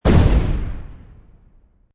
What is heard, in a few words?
Explosion Grenade, Hand grenade. Short explosion; Explosion Sound Effects